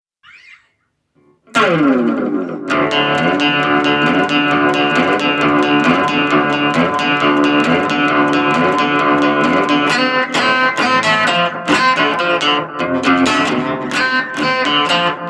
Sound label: Boogie